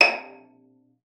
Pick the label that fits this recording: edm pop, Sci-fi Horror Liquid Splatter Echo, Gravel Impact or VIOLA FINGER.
VIOLA FINGER